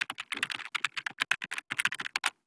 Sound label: Keyboard